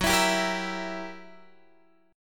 F sharp 7th Flat 9th F sharp down Guitar Open G

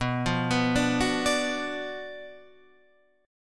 Gb Major 7th Suspended 4th Sharp 5th

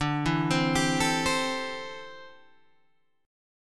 D 7th Suspended 2nd Suspended 4th, Guitar Open G

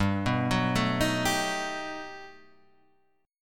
G Augmented Major 9th

